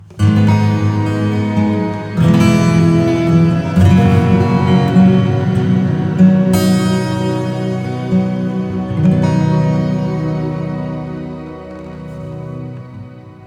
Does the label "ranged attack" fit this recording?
No